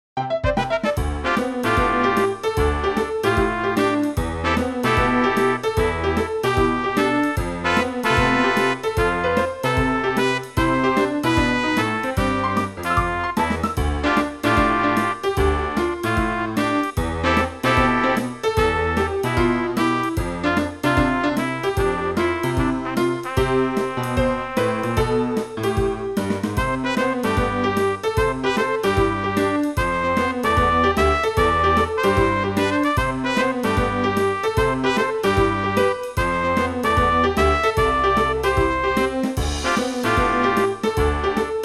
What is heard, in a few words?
game-center, ショート; ジャズ